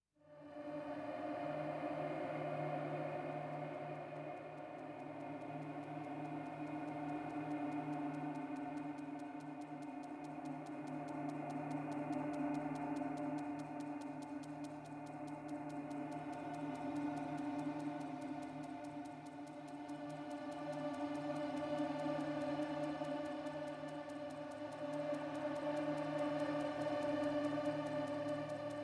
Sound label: Ticking Bass; Nordic Drama Textures